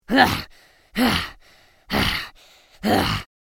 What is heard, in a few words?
Mobile game character tired breath; video game